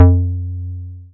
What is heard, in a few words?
Bass SH-101